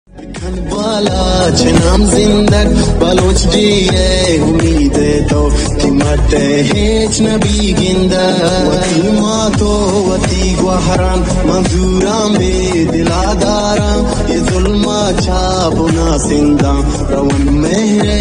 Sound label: remix